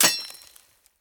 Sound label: Harpoon Hit Rock; Gameplay Wpn